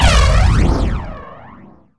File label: Photon Rifle; BaboViolent 2